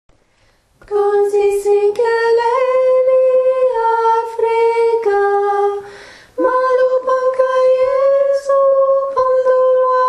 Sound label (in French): voix principale